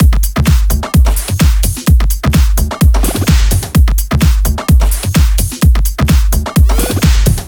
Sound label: 128BPM Heavy Duty Kit